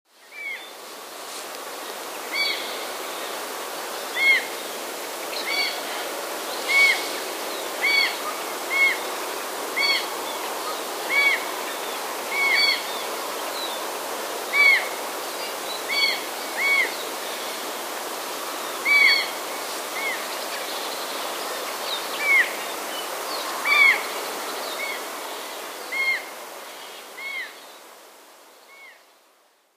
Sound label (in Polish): sarny kozy